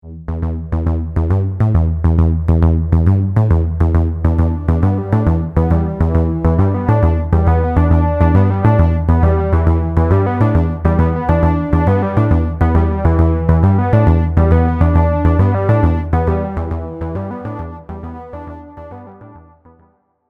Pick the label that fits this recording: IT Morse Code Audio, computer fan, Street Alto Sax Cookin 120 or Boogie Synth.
Boogie Synth